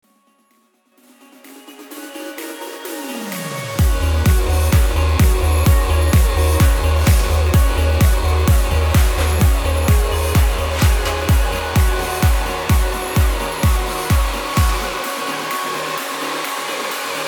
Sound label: Progressive 128 BPM